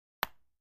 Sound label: Golf Club Hits Ball